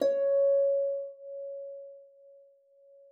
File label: HFEM C5, HARP; Xsample VOL-8; HARP MF